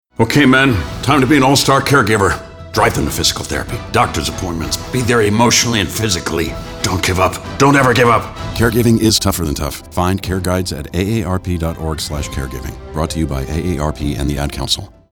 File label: arp